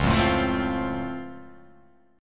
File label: C sharp Augmented Major 7th down, guitar chord